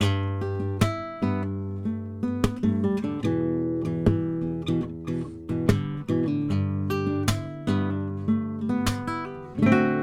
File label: guitar version